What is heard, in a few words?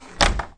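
Door Close Click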